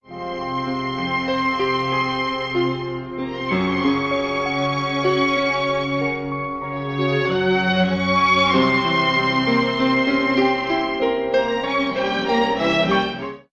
strings; Sad

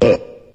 burp short

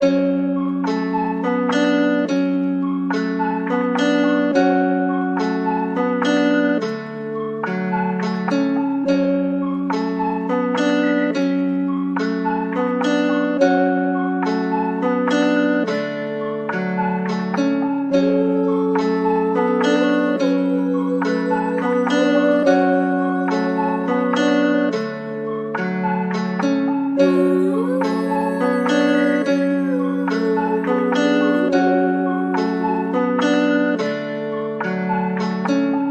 generic hip hop sound, Electronic Loops

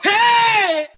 "hey", male SUNG

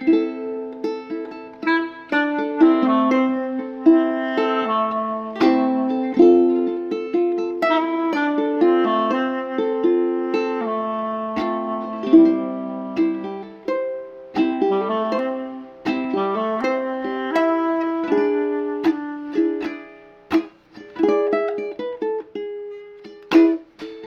guitar and sax, Phonky Loops

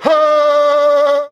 "ho", voice; ooh ahh

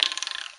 rebound clack, percussion; Misc Percussion

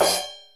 crashmute, Real Closed Hats

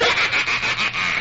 laugh devil; ooh ahh

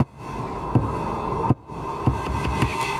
breathe in; Drum loops

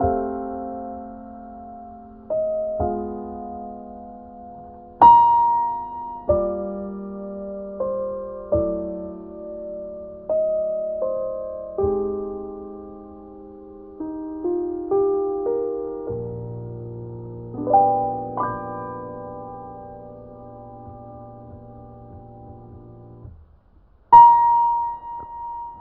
piano, Loops, Sad

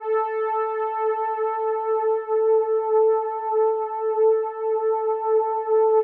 pipes a lot, Synths; Notes

